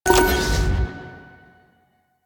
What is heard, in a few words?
Hack Minigame Click E